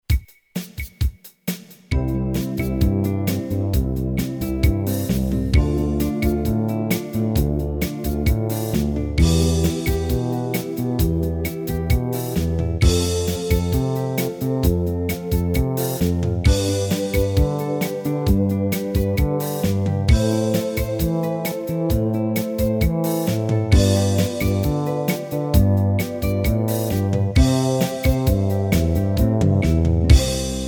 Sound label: 1st Inversion Toss For R.H., piano